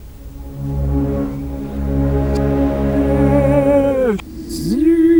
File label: Scena Bass & Alto Soli